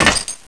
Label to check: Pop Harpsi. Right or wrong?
Wrong